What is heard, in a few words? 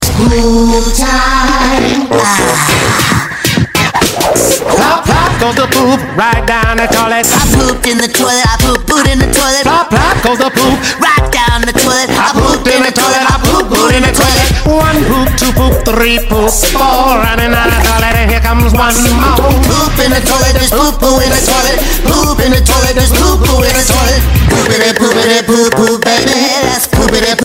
Plop plop song